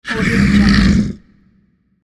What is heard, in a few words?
monster roar